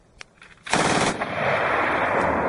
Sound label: Mg42